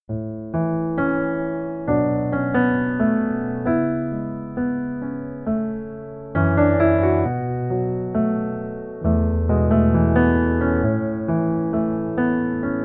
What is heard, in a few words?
Piano Version